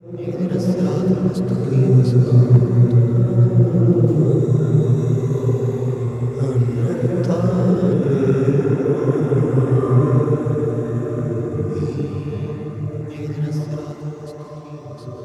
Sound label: Vox FX; Sonic Foundry (Sony Creative Software); World Pop